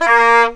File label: SAX TON; E-Lab; X Static Goldmine 2; akai